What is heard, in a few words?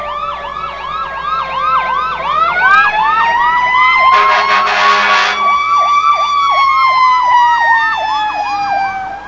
firetrucks, siren